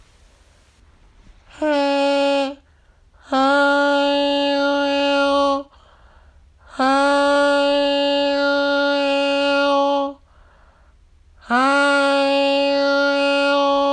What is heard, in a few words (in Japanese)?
オープン ハイ 女性